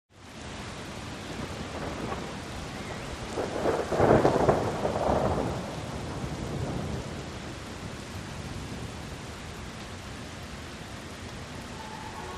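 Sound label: Thunderstorm Exterior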